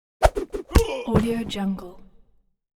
ax throw with body hit

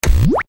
tv tune out; sound ideas

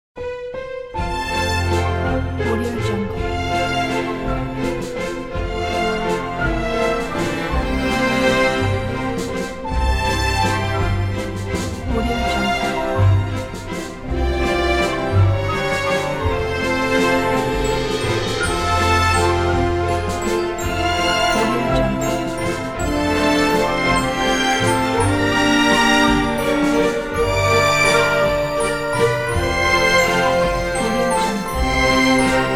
royal classical dance

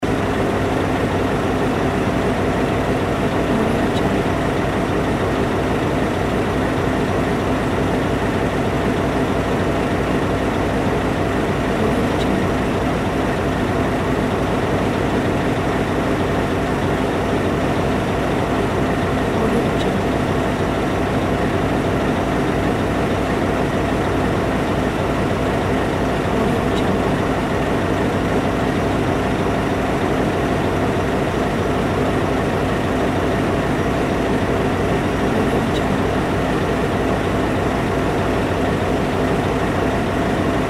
locomotive idling

